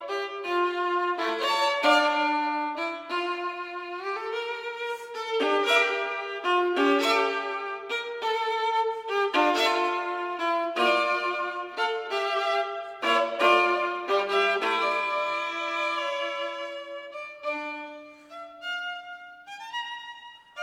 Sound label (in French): Chaconne; BMV 1004